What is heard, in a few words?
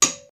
metal hit; wood metal SFX